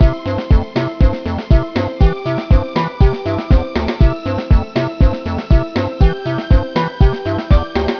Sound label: samba pop; Touch Darts